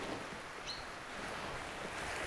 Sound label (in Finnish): talitiaisen peippomatkinta; onko tuokin talitiaisen peippomatkinta?